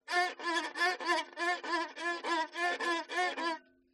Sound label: violin tremelo dynamic; aston stealth